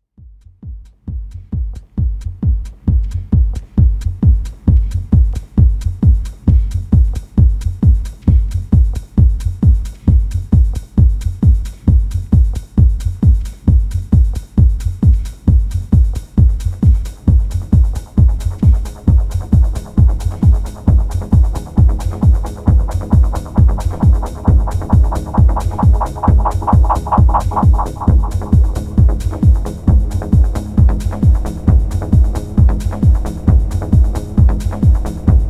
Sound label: Locked groove 1 and 2; Lackrec